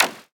Brown noise percussion electronic clap

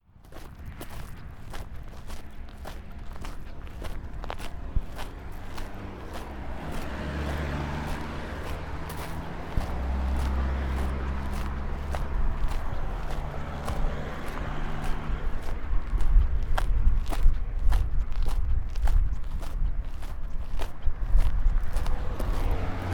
Steps And Traffic Road

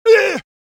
Male Fight

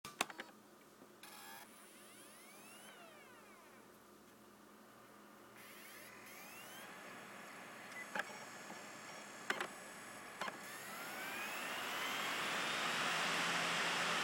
Cd Spinning In Drive

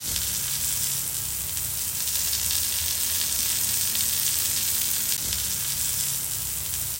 Water Hose

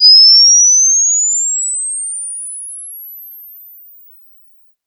Flash Charging Warning Very Loud

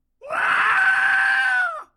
Screaming

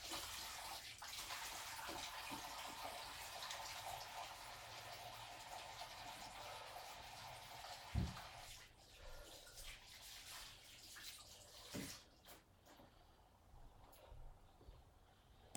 Washing Hands

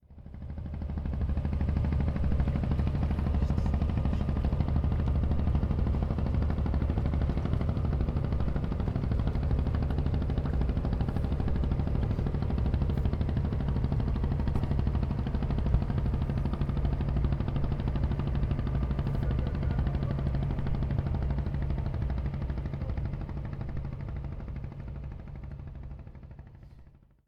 Fishing Boat Passing

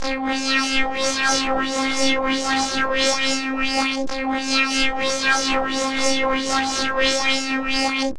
Mad Science Lab

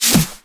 Drums Snare Clap Slight Intro Woosh House

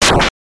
Slash RPG

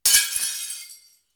Plate smash

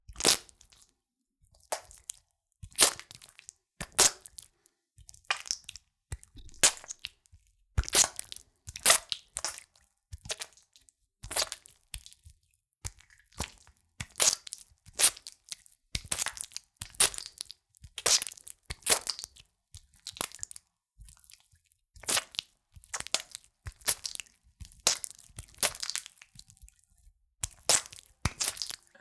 Gel Squelches

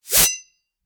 Knife Slash Spike Trap Sharp Sound

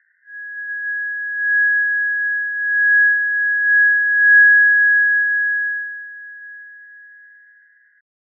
Wine Glass Hum

